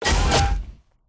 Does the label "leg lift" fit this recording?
Yes